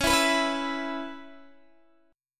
Db Minor 7th Db Guitar Open D

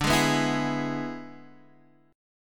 E 7th D, guitar

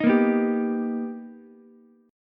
B Augmented Major 7th B Ukulele, chord; B+M7; strummed; JGuitar

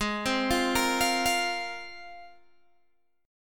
G sharp 6th Add 9th G sharp Guitar Open G

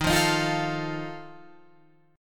Eb Suspended 2nd Flat 5th, Guitar, Open F; strummed; chord; JGuitar

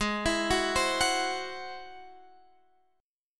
G sharp Augmented 7th G sharp

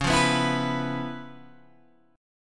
Db 7th Sharp 9th Db, down, Guitar Open G

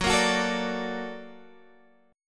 A sharp Augmented Major 7th

